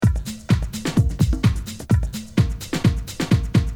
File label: drum loop